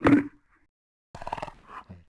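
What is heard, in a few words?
Bear Fidget Vox; Civ4 warlords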